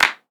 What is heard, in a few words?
Foley